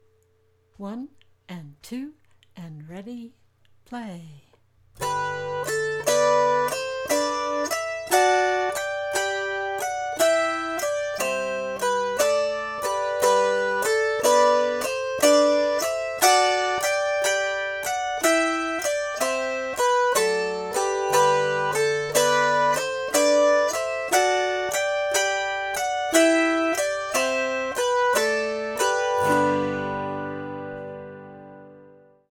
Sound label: G Scale pinch pluck